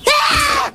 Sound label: scream; kimboot